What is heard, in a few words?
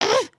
headspin, emotes